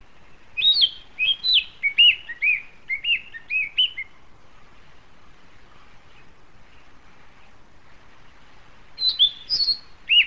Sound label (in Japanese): kibitaki; bird